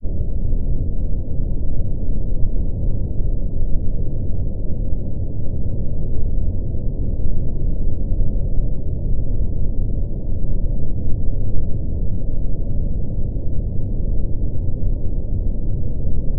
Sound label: sound design drone dark; sound effects one